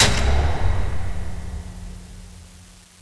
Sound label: light power on; XDM; buttons